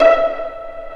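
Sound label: Vio pizzicato E4; Digital Sound Factory Virtuoso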